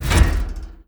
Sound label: tick, carousel; hinges drawers